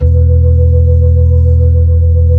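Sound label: organ, Keys of the 60s and 70s; Roland LCDP10 Keys of the 60s and 70s 2; Blues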